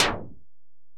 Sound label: EPerc, Alesis DM-PRO; 300 Drum Machines